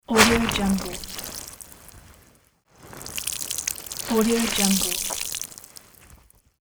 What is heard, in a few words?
blood spill